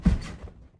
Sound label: Capsulte Bounce Heavy